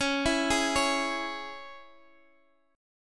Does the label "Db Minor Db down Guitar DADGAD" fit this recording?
Yes